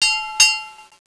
click

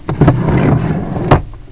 Open Drawer

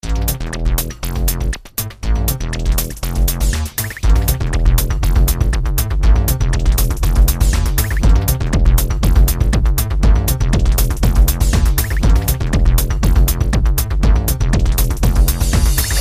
Jungle; balloon rain; Music

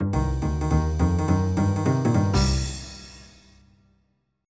jazz

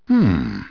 Hmm; 250 Desktop Themes

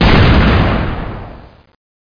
Explosion Big; XWing; FE Low Res

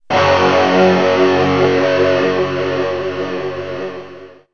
Rock Stab; Music

